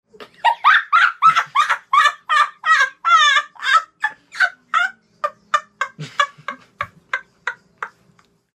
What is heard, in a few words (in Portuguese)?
risada do gordinho